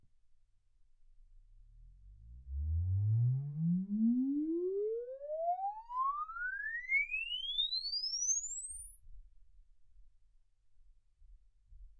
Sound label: exp swept sinus 10Hz 24kHz